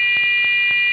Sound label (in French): 450Hz tonalite stop; APT2400